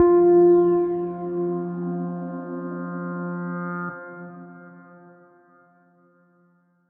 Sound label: Stinger Main Menu; pan pan a tiny big adventure